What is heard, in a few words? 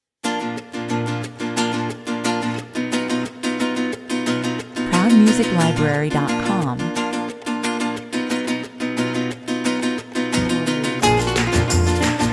spain traditional 89 bpm